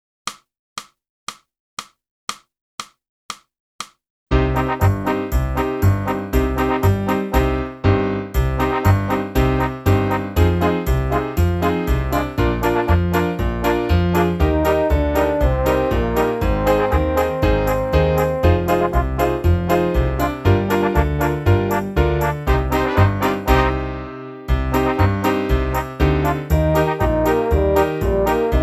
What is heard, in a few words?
sax